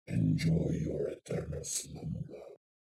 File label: Dragon Black Hard Fight